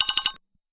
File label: Button Press Repeat Ha